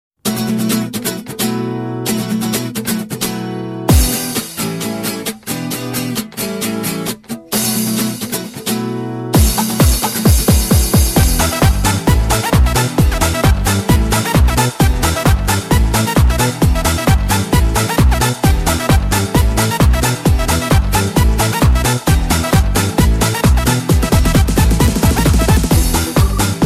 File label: brass minus